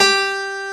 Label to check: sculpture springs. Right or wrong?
Wrong